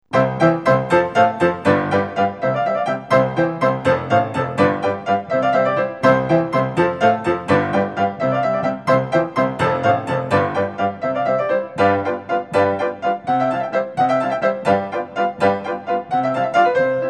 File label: Waltz in C major; SHUBERT; Op127